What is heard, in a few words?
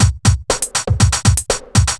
chemical 120, loops, 120bpm